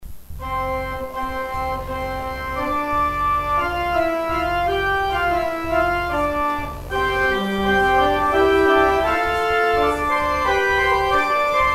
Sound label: organ outro; Organ exit music